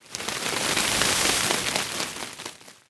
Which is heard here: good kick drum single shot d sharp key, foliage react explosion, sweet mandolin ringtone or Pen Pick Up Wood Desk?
foliage react explosion